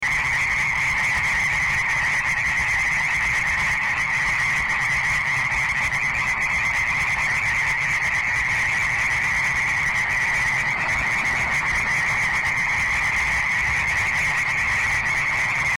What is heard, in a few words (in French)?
hyla chaud